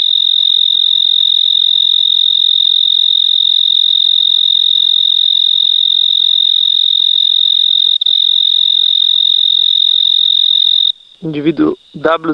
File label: Oecanthus pictus, 22,5degree Celsius